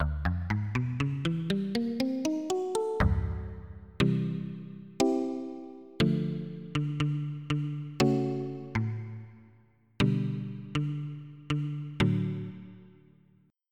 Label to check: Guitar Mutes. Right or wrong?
Right